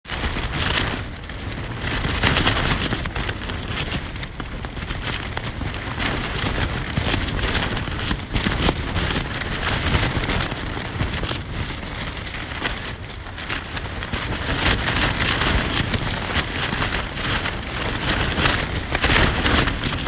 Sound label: Windy night at Seamans Hut